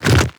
twitch, zombie blind, foley; creatures

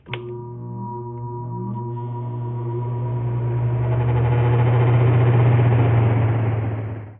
air conditioner cycle, machines; ambient; Counter Strike Model Pack; CS:GO

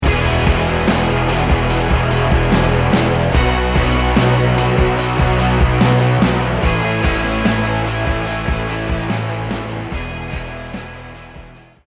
start action, music

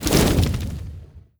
fly end, antlion wings; creatures

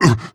breath short, zombie

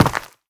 step run, footsteps, player, dirt

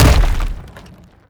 bash medium, brickwall, destruction

